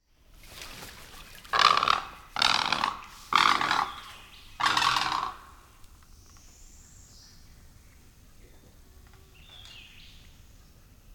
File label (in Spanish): garza blanca, aves; lampa